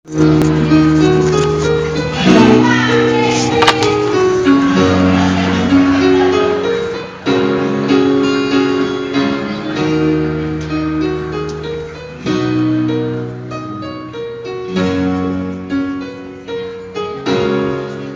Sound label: rap